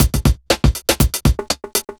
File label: chemical 120, loops, 120bpm